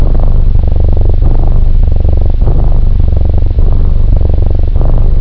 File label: soft purr